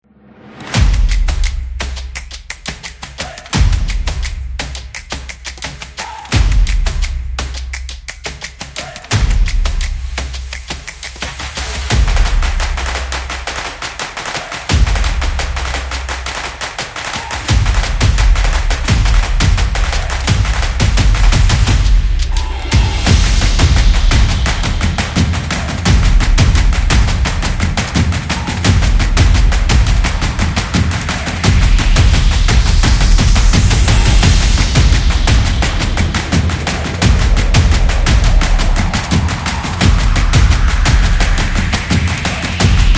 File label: Sport Percussion